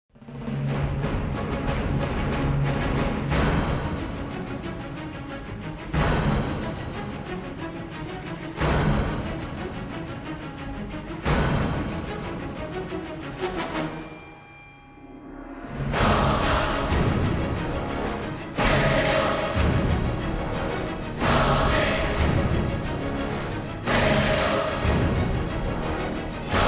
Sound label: Trailer Themes (Choir)